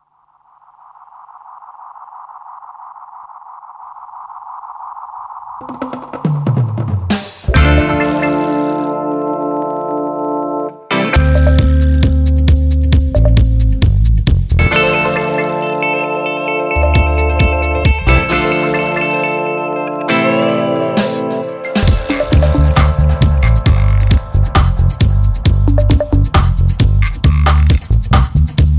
Tribal African Dub

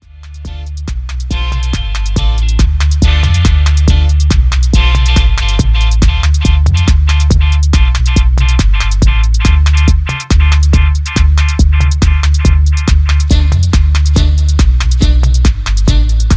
Upbeat Vibes

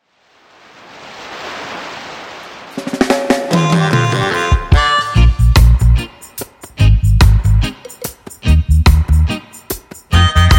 Sax Talk

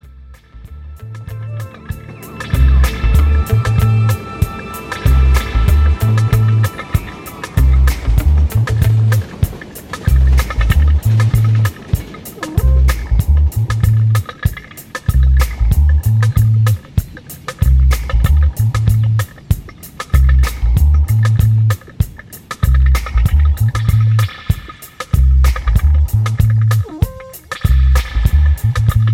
Ambient Dub

